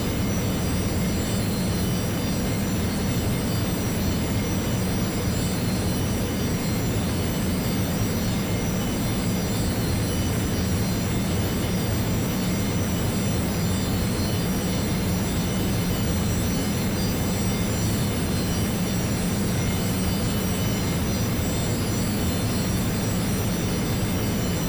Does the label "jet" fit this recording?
Yes